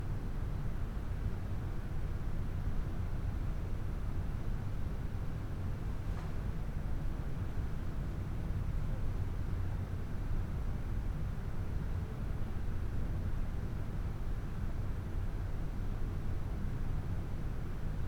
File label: Roomtone Hall